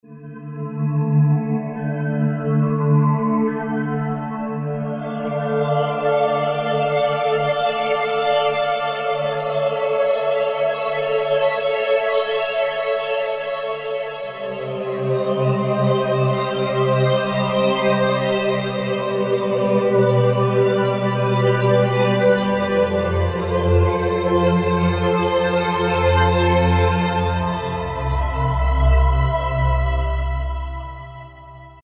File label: Korg T3 Monsoon